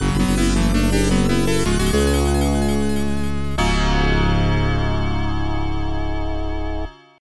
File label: Logo; Wolfteam; Arcus Odyssey; Genesis